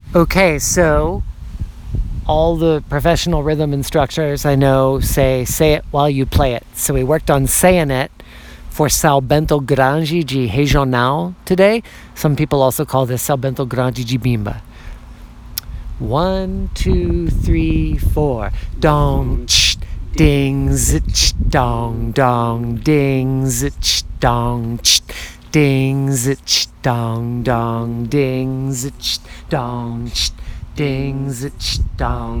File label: vocalizing sao bento grande de regional; Capoeira Music Practice Material